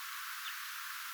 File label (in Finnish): siina on hyva esimerkki niista jip-aanista joita kuului hemppoparvesta